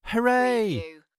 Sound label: Hurray; beanstalk audio